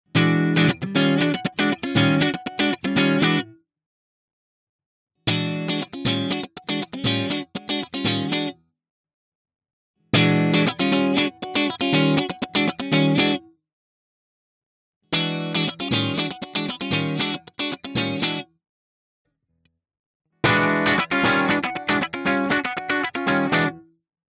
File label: JACKSON AS Virtuoso SHP clean Funk all positions